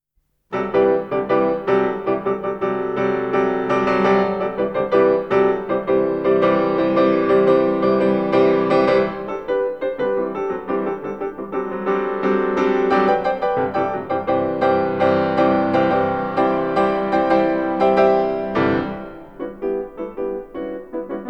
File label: Chords Together and Opposed, Piano Works; Bartók; Mikrokosmos, Sz. 107 Book 5 No.122